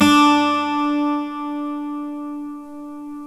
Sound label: 12 STRING; OMI - Universe of Sounds